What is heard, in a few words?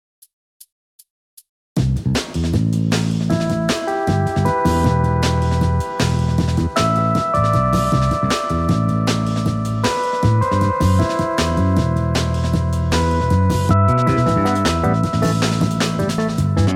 core one fifty six mono